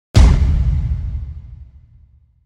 stomp, creature nosalis; garrysmod; metro

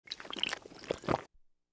soda drink; garrysmod; food

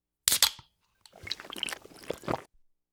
soda open, food; garrysmod

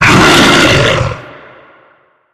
nos idle food up, creature nosalis; garrysmod; metro

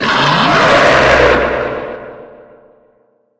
attack growl, creature; garrysmod; metro

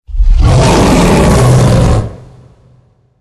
danger idle, nosalis creature; garrysmod; metro

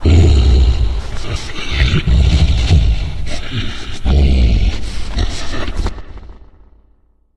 growl idle loud, nosalis creature; garrysmod; metro

